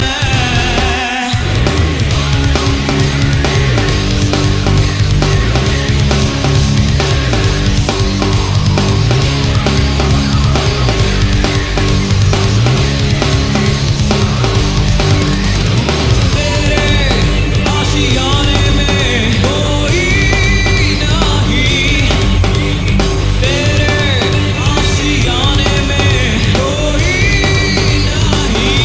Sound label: Countryband solo